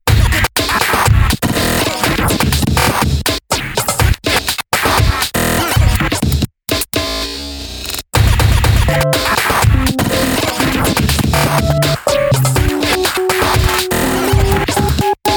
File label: snare